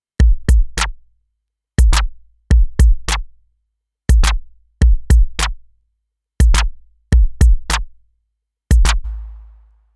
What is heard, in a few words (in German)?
Roland MX-1 Beat BFX Slicer auf BD SN